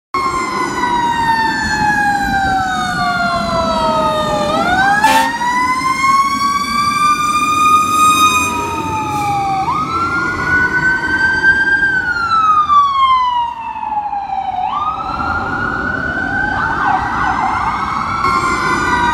Fire Engine Ambulance Alarm

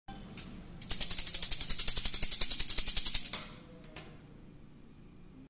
abacus; چرتکه

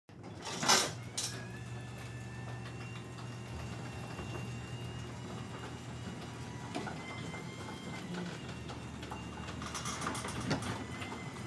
Parking door, باز شدن در پارکینگ

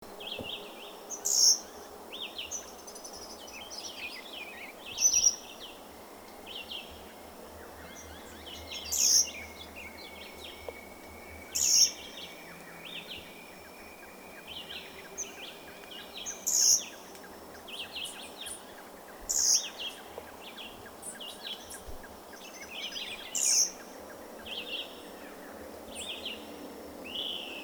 Euphonia violacea

